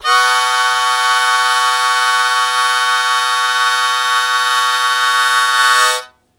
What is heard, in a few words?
Blues Chord Attack B1, Harmonica; 90 s Sample CDs; Zero G